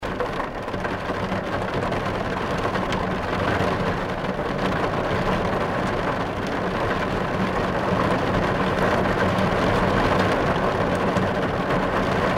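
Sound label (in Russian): в машине по лобовому стеклу гром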